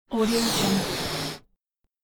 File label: cartoon flying